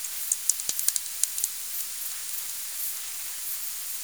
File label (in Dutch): nacht, vleermuis; Ezelgasterij 2018